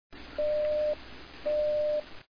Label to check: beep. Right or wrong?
Right